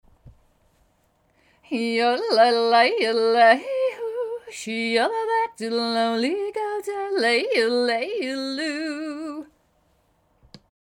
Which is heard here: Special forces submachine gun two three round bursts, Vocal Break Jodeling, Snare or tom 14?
Vocal Break Jodeling